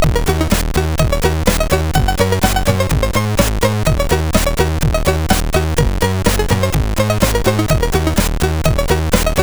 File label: Jingled, sid CSG8580R5